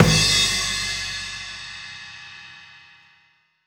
Snare, Crash, Kick; Essential Percussion

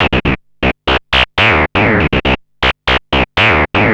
Analoop, Industrial

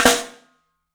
MARFLM, 3D Drums, MARCHIN ROL; 3D Sound

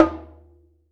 TERA, Ritual Drums; E-MU Producer Series Vol. 7 – Old World Instruments

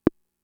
Conga, Fricke MFB-522

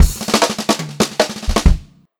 FILL, Pop-Rock Drumloops; USB Soundscan vol.37; AKAI; 90 s Sample CDs

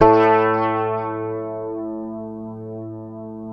Fingered bass A2 L; Sampleheads; Will Lee Bass Library

